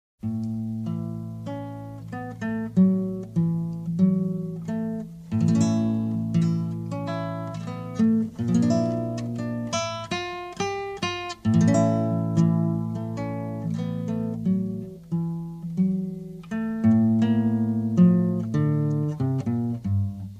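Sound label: green fire guitar version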